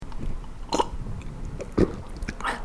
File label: holy water drink